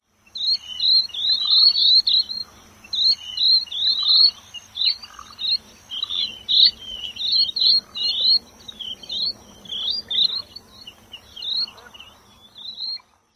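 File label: calls, bird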